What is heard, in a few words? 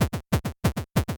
8bit se